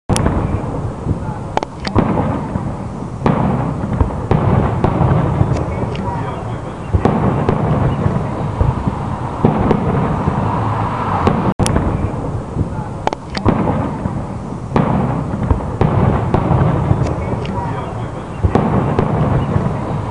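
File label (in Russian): звук стрельбы из винтовок на полигоне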